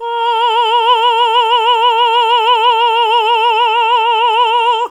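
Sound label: Classical Female AH, choir; Best Service Gigapack 1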